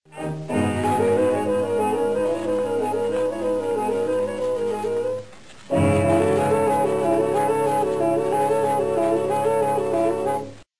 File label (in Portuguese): Lamento quartas